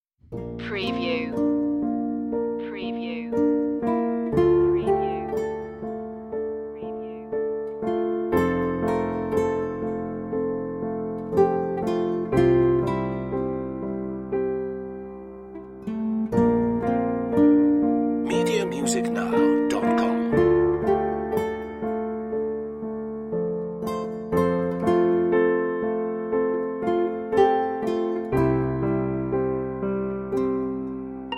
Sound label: relaxation no sound fx